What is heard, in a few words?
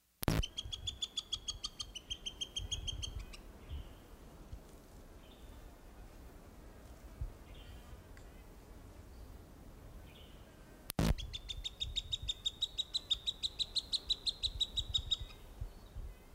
juv coopers hawk